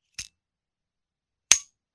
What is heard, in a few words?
Click Scripto